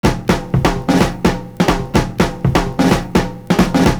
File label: BROKEN SNR, loop; Univers Sons